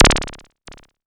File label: bleep, Korg Electribe ER-1; Kit 15